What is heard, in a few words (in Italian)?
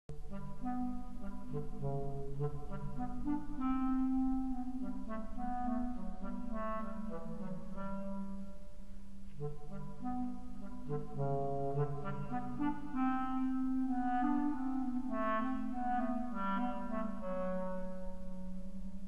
gatto clarinetto